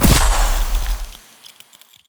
ice prick buff

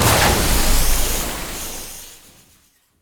water pipe burst

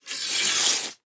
scrape book

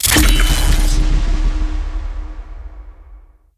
light sword

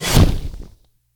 firesword

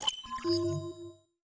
ui get ready

